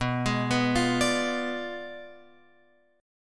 B Diminished 7th, down, Guitar, Open F; Bdim7 arpeggiated; JGuitar

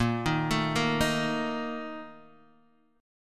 A sharp Major 7th Suspended 4th, Guitar DADGAD

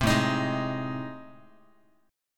A Minor Major 7th Flat 5th, Guitar; strummed; AmM7b5; JGuitar

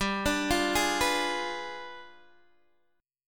G 7th Flat 9th, Guitar, Open D

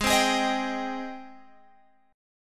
Ab Major 7th Ab, Guitar Open G; 18,17,18,20,20,x; down

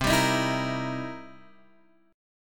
C 7th Sharp 9th C Guitar Open G

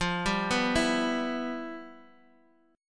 E Minor F, chord; Em/F arpeggiated; JGuitar

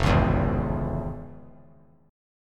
F Minor Major 7th Sharp 5th; strummed; JGuitar

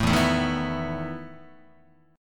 E Major 7th Suspended 4th E down Guitar; strummed; JGuitar

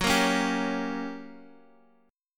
G Minor Major 7th Flat 5th, F sharp

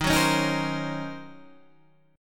D sharp Minor 13th D sharp, chord

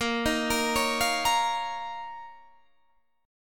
F 7th Suspended 4th Sharp 5th Bb

